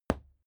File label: fight impact sweetener tiny